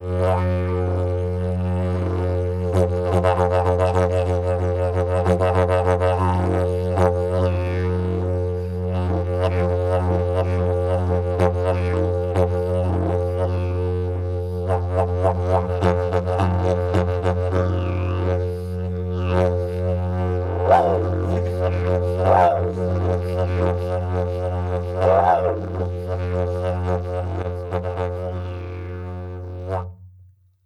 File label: Didge